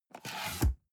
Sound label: cardboard box open unfold scrape short; objekte